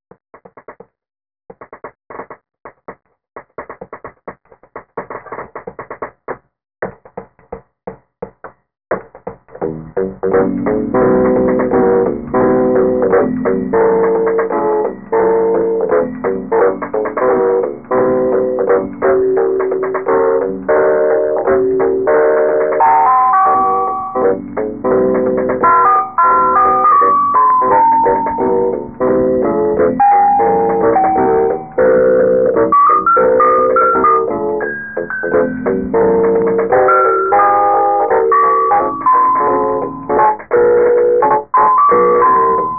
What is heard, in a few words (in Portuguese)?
rapidinha